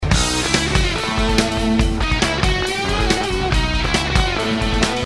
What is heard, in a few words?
drums, Groove